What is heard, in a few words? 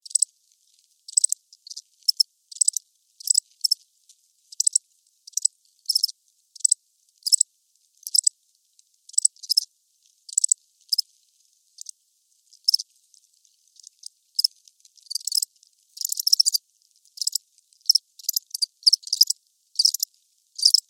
Animal, Crickets, Chirps, Movement, Close, Insect, Bug; Fly; Flies